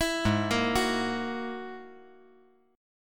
G sharp 7th Suspended 2nd Sharp 5th; G#7sus2#5; arpeggiated; 9,8,6,9; JGuitar

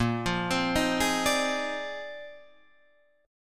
Bb 11th, Guitar, Drop D

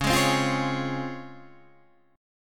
C sharp Major 7th C sharp, Guitar Standard; C#M7 strummed; JGuitar

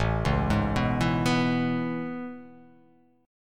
A Major 9th A

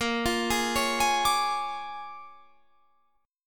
A sharp 7th Sharp 9th, guitar chord; down

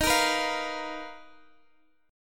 B-Major Sharp 11th Eb

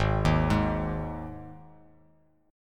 G sharp 5th; arpeggiated; 4,6,6,x; JGuitar

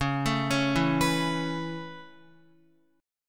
Db Minor 7th Sharp 5th Db down Guitar; arpeggiated; 4E,4A,5D,5G,5C,6F

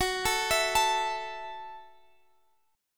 F sharp Minor 7th, Guitar, Standard; F#m7; arpeggiated; x,21,19,21,22,x; down; capo=3

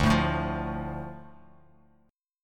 D Minor D Bass Standard; Dm strummed; 10,12,7,10; JGuitar

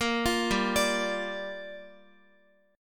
G Minor 7th, Guitar; Gm7; arpeggiated; x,x,10,10,7,10

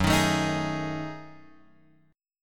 F sharp Minor 6th Add 9th, Guitar; F#m6add9 Chord {2 X 1 2 4 4} strummed

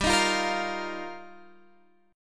G Minor 7th Sharp 5th, Guitar, Drop D; chordshape; down

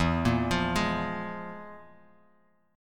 F Minor Major 7th Double Flat 5th

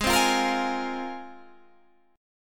C 7th Suspended 4th Sharp 5th

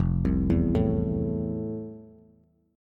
F Major 7th F, Bass; arpeggiated; JGuitar

